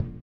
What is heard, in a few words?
VIBRA; Spectrasonics; Metamorphosis